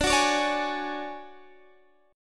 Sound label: D Major Sharp 11th D Guitar DADGAD, chord